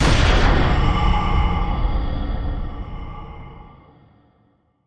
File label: Hollow Boomer Impact